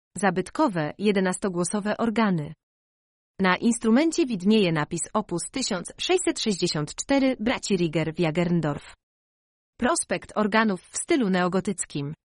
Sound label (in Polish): zabytkowe organy